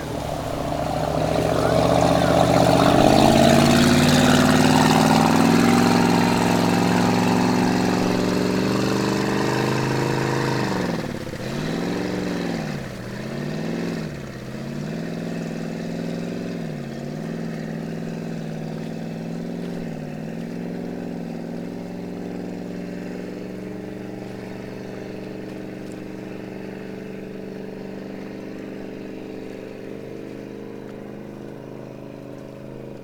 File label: boat racer; best service Blue Box; sea traffic